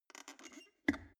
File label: plastic water boiler close lid